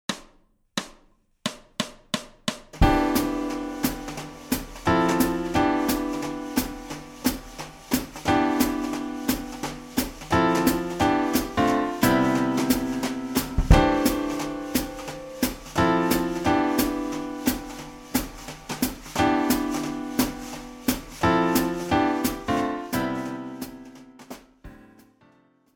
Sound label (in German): c ionisch